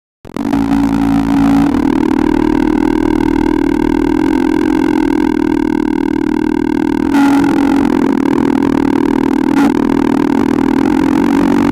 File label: a goblin singing and a, dubstep